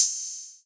Open Hat; Normal; Cymatics; Drum One Shots

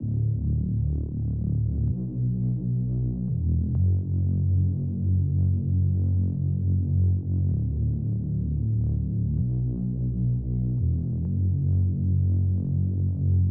128 BPM F# Min Reese; House; Loop Stems; Cymatics; Breakdown Loops; Mothership

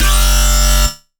Bass F# Scream, Dubstep; Bass One Shots; Ghosthack; Dubstep Sound Arsenal; 50 Bass One Shots

